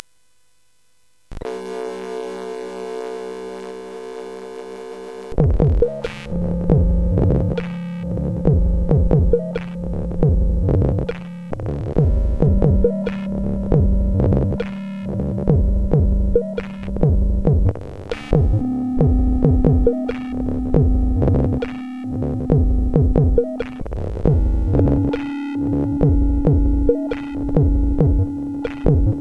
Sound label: sid CSG8580; Another Remix; hvsc